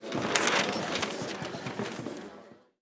school scholar; PHARAOH

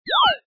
pickup; shopdrop

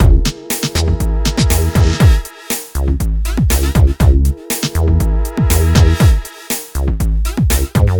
groovebox

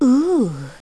"ooo"; VEGAS Girls

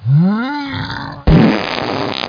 sumo fart

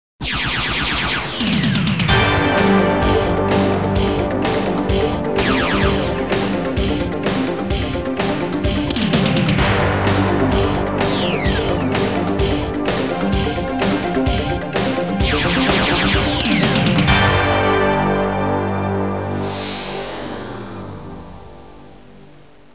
zap music; QuickFLIX!™ 1.1.1; Sample Media

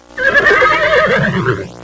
horse neighing

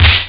techno, drums snare; OctaMED SoundStudio V1

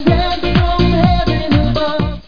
mail; Heaven

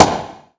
shot; VirtuaTennis

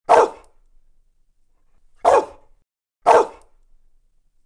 DOG, residential

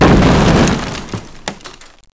crate, impact

